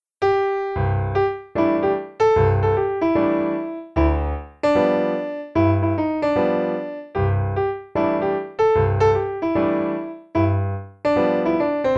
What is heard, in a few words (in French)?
Piano seul; Jazz